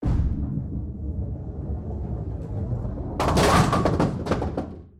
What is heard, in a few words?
bowling